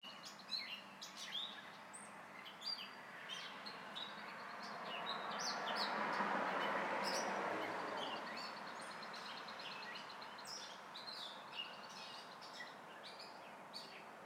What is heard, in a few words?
birds cars